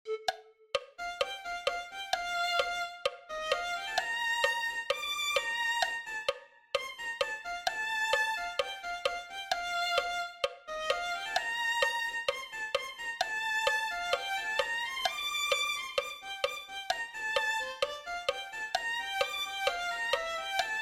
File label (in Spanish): violin